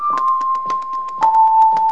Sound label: dog drum